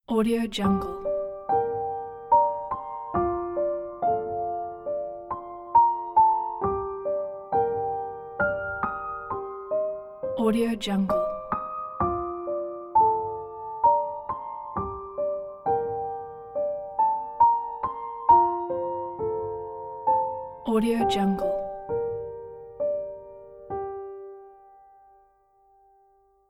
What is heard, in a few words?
sad lullaby piano only